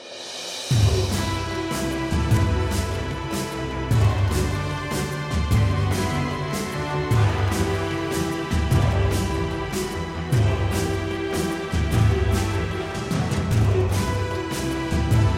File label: misc battle